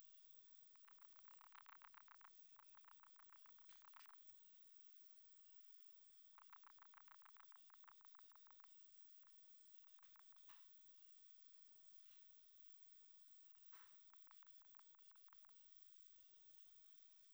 Rhinolophus affinis or stheno 20kHz HP

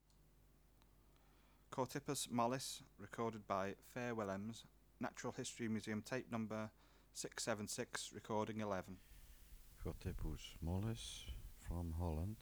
Chorthippus mollis

